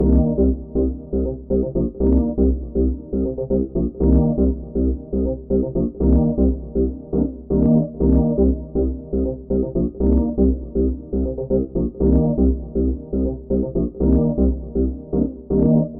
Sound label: Simple Melody Loop